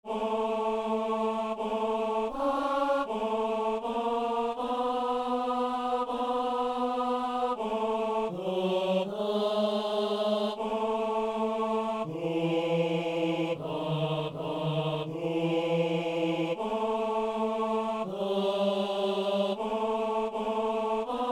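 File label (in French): vienne ton règne sur notre terre, hommes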